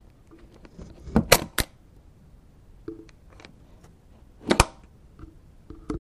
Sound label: Clasp lock